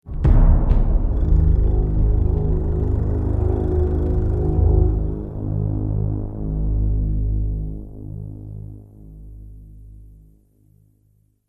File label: Shock Stinger; The Walking Dead: The Telltale Series